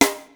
GROOVE KIT, ENSONIQ TS-10; Rubber Chicken Software Sample Bank

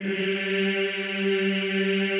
EEH G2, Classical Choir; Best Service; Extended Classical Choir

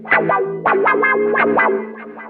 GUITAR

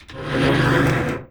MILL, household; AKAI Anime Sound FX

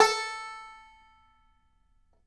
banjo A4, banjo notes; Sampleheads - Whole Lotta Country 1 & 2

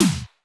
Korg 01W MegaDrums; 300 Drum Machines

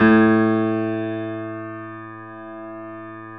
GRANDP ST A 1 F; USB Soundscan vol.17

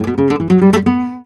g, Flamenco Guitar Picado; Picado 1

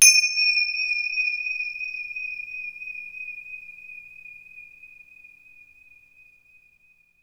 FINGER CY L, Synclavier Percussion; ILIO; Synclavier Percussion 2; World & Orchestral

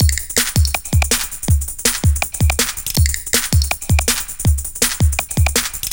Flumps 162, Jungle Straight Loops; Zero-G Jungle Warfare 1, 2 & 3

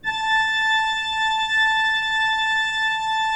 pipe organ, 3-D Audio

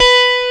B4 TELECASTR

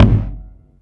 bass drum; Zero-G - Chemical Beats; BASSDRUM ZOO

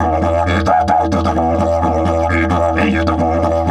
E 130, didgeridoo

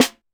Percussion, Korg 01W; 300 Drum Machines

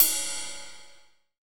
THIN RIDE, HATS + CYMBS; Zero-G; Funky Elements

